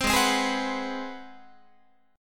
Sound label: G sharp 7th Sharp 9th, Guitar; strummed; 4A,4E,5A,5D,5G#,6C#; G#7#9; 15,16,15,18,15,17